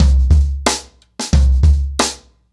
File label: 90BPM, ACOUSTIC DRUMS